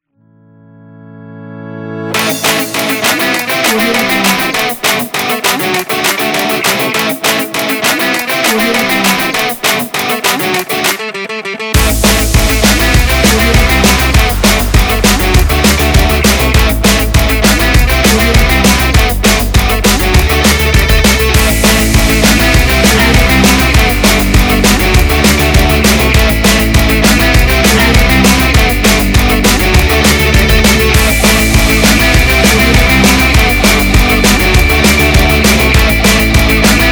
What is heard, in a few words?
energetic punk rock